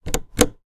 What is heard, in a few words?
click